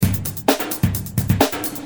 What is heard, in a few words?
Drums, Perc, Industrial